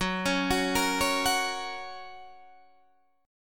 F sharp Major F sharp; arpeggiated; 14,16,16,15,14,14; JGuitar

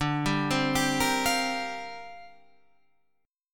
C 7th Suspended 2nd Suspended 4th D

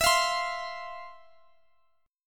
F 5th E, chord; F5/E strummed

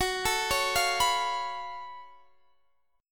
Gb Minor Major 11th Gb down Guitar Drop D

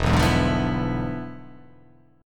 E 7th Flat 9th, down

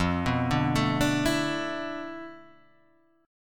D Minor 6th Add 9th F, guitar chord

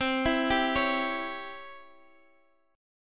Db Minor Major 7th Flat 5th C

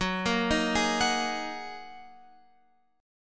Gb 6th Add 9th Gb down Guitar Open F; Listen to Gb6add9 arpeggiated

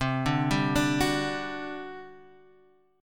G Major 7th Suspended 4th, C

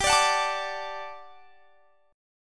G Major 7th Suspended 2nd G down; GM7sus2; strummed

